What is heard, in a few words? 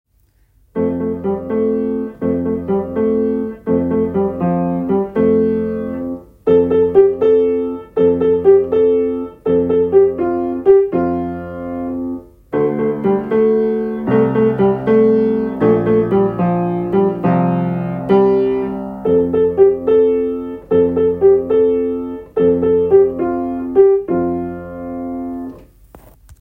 Swinging monkeys, piano